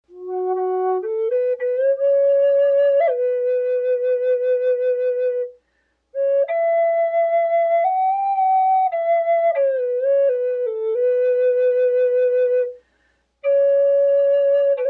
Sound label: Large F sharp Pink Ivory, flute; Flutes